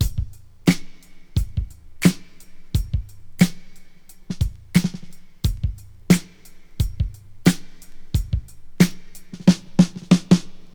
89 bpm 00s drum groove e key

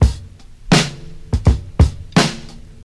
84 bpm breakbeat c key

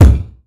rich mid-range steel kick drum sound C key

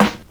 Rich Low End Snare D Key; snare drum samples

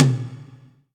long tail reverb tom drum sample a sharp key

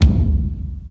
large room kick drum sound c key; 80s

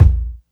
rich low end bass drum single shot a sharp key; Duration 0:01; Channels Mono

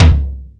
hi tom drum c sharp key

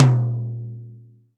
big tom drum b key

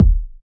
solid urban steel kick drum sound D# key

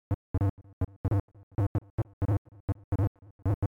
groovy analog bass; instruments; loops

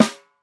clear steel snare drum sample a key; snare-drum-samples

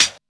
studio hat sound f sharp key; hat samples

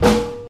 puffy snare sample a sharp key

